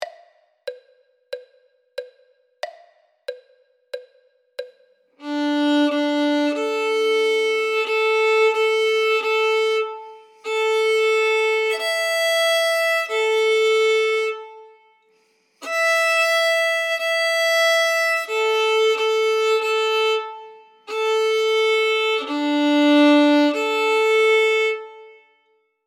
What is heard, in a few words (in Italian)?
violino I